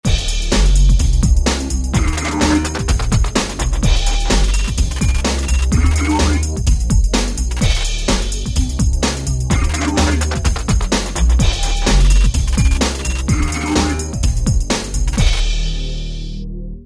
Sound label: Boomy Big Beat; Korg ES-1; Factory Patterns